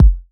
Kick, Kickdrum; Equipped Music; Loungin House

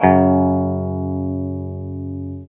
guitar 5TH

